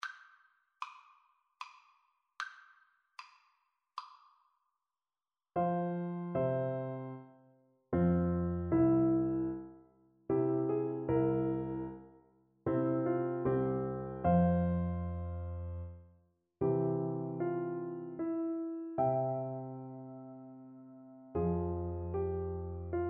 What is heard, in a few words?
Soprano Saxophone